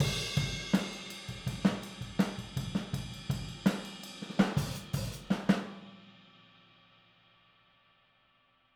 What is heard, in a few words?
Boogaloo - Outro - Overhead, 82 BPM; Sonic Foundry; Drums from the Big Room The Mixes